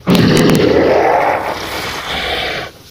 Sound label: die; svencoop; custom monsters; overlordwizard